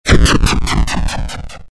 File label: boss die